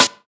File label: airy steel snare drum sound b key, acoustic snare tuned to the B note